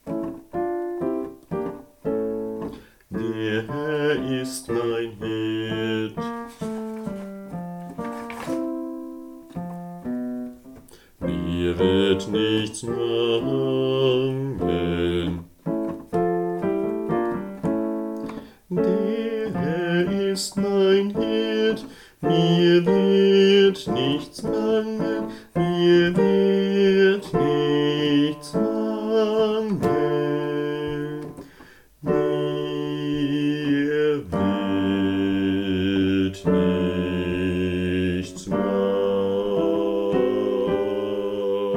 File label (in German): Bass